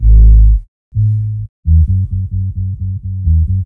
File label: BASS LOOP, Ragga & Dub Reggae